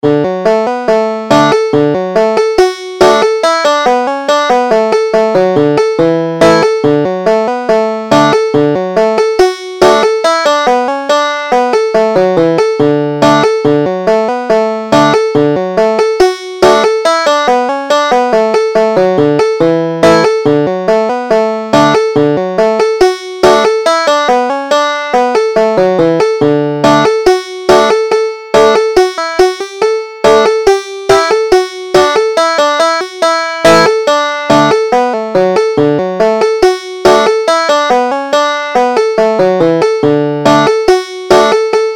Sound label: grand picnic, banjo